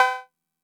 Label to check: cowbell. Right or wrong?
Right